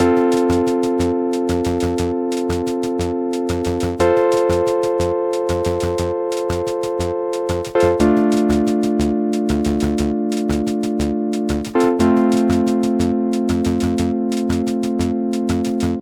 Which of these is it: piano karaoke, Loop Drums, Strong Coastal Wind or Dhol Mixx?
Loop Drums